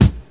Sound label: studio bass drum single hit a sharp key